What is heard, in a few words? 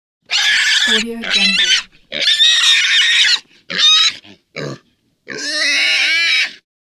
pig squeal multiples; hollywoodedge